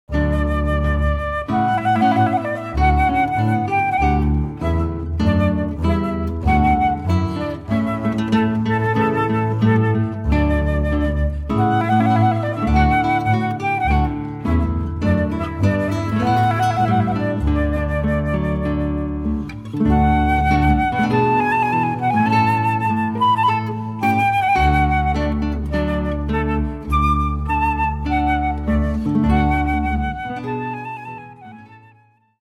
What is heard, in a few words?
Trumpet Voluntary, guitar flute bass trio